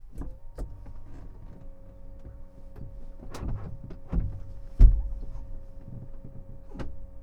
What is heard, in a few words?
Bell 206 Panel Movement Wide AB Passenger Seat, Longranger III; 206L-3; 1983; MKH8020; Pole Position